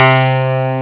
Steinway

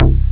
Pop Bass

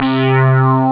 Big Bow

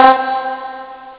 Tine Wave

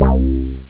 Filter Bass

